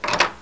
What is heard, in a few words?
knob; Recycle!